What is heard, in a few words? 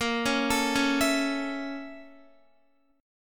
A sharp Minor Major 7th Flat 5th A sharp Guitar; A#mM7b5 arpeggiated